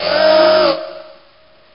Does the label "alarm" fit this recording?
Yes